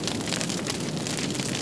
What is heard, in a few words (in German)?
feuer; Tomb Raider PS1; lara